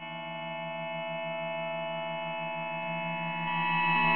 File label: harmonic bell fx; Bells